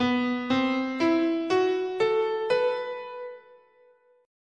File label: B Traditional Japanese "in sen"